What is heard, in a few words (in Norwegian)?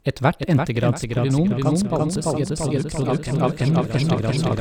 Tilbakekobling